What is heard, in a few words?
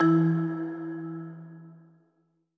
marimba; Sky Children of the Light gamerip